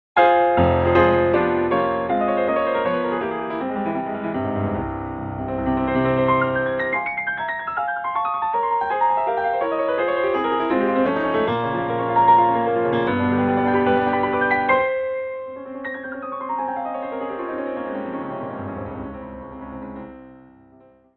piano strong

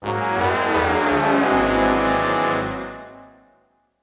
Fail; Jersey Devil; PS1, Windows

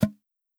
Human Hunter Pop; Legend of Keepers

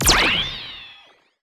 Small Cone

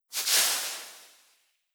Nature

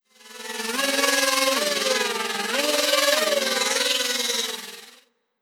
Engineer Radiant Drone

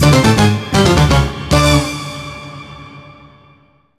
Match Won

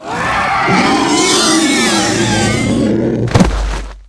Pig Die

